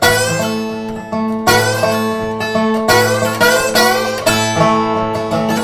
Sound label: dobro